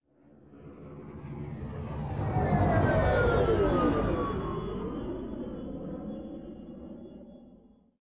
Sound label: X-wing flyby